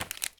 plastic generic drop; Escape from Tarkov

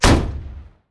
Hit In, Damage; DCS

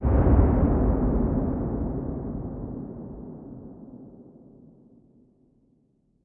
impacts; World of Tanks

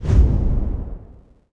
mid air suspension enter

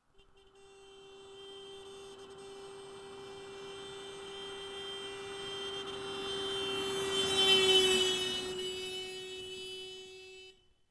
Horn Doppler; Ambient; Civ4 warlords

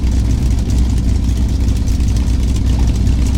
mustang cockpit, engines; War Thunder

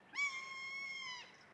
ambient; World of Tanks

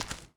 grass walk

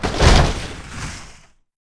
tent; World of Tanks Blitz

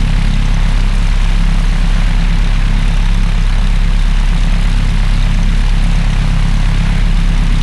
Aircrafts Engines DB605 Prop; DCS

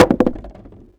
grenade wood

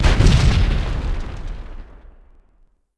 Bomb Two Explosion; Civ4 warlords

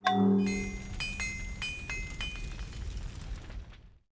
Forge; Buildings; Civ4 beyond sword

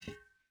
ui pickup metal hollow large; Rust

